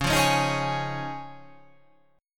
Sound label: C sharp Major Add 9th